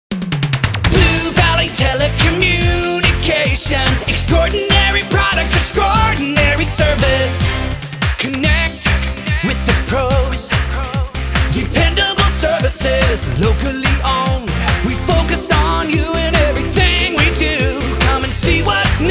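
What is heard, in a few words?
jingle, acapella jingles; Blue Valley TC; telecommunication jingles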